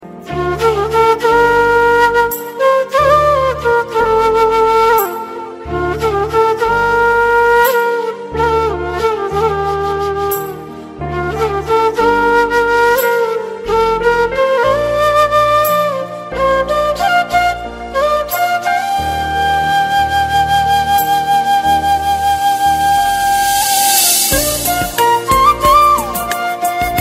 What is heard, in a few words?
FLUTE RINGTONE